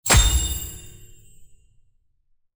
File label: Exp Change